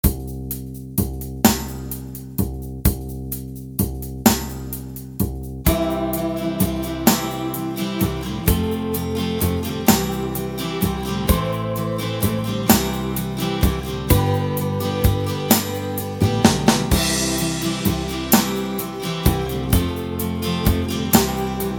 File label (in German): Halbplayback